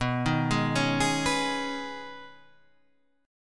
B 6th Add 9th B down Guitar Half Step Down

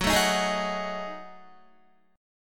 Gb Minor 6th Add 9th Gb down Guitar; Gbm6add9; strummed

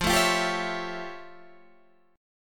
F Augmented Major 9th Guitar Standard; strummed; 13,12,15,12,14,12; down

